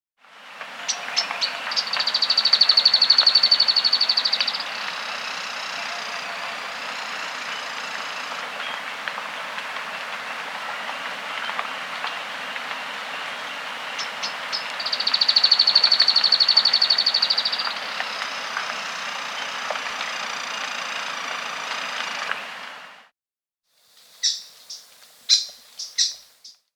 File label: Streaked Tuftedcheek (Pseudocolaptes Boissoneautii), Andean Birds; S. America